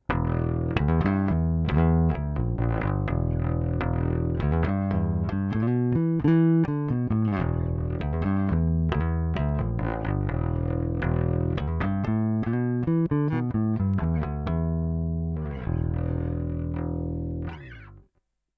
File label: Ampeg Heritage 50th Anniversary SVT 1975 flat Amp DI